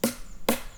Baton Secs Coups Secs; Percu Bois

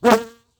Abeilles Bzt court intense FORT; Vols Bourdn abeilles

